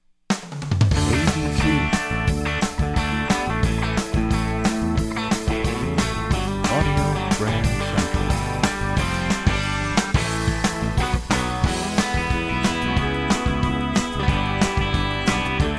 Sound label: American Country, radio jingles